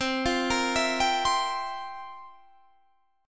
F Major 7th Suspended 2nd Suspended 4th, C, guitar